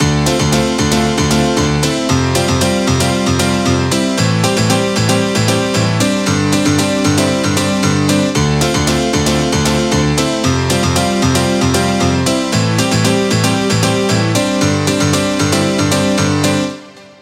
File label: Rhythm Chords 115BPM, piano; nikos ultimate midi pack